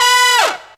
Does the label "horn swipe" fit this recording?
Yes